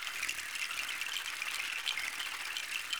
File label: reel spin